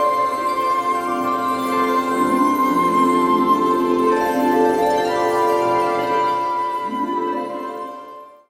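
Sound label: Music Confirmation box Loop; Crayola Scoot; Katya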